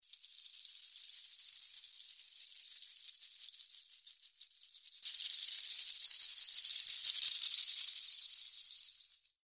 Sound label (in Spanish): cascabeles